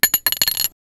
tool

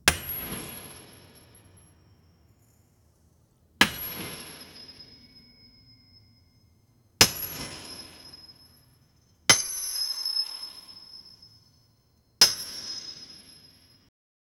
a dark soundscape heavy; dark ambient

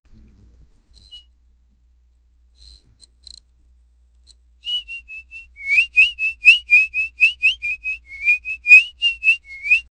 little robot chirping

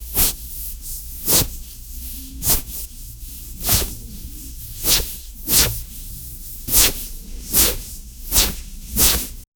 intense hand rubbing asmr; high definition

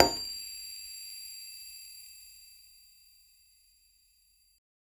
a short slightly brighter, metallic tap

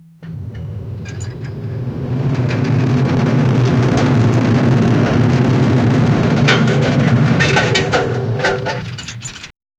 heavy tank slowly moving

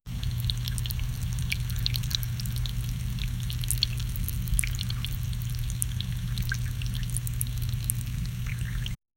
sound of a very large, fish

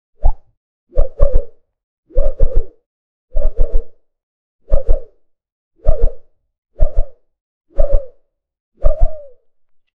small video game dragon

